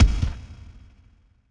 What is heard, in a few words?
walk; Magton; Gamigo